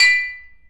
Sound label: GLASS, household; AKAI Anime Sound FX